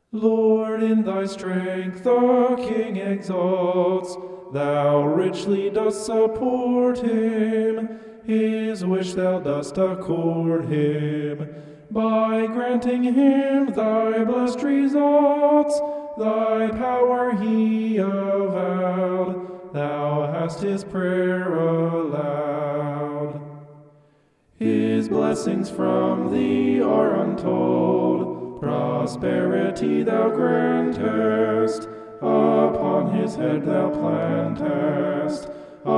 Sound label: vocal homoph; psalm 21